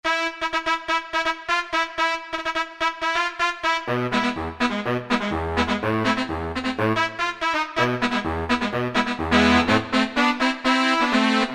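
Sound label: Brass Reeds, Yamaha TG33; Factory Presets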